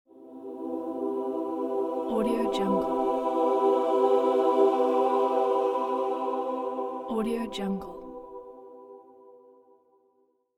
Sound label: angelic choir